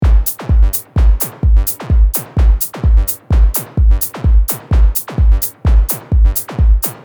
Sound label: modular loop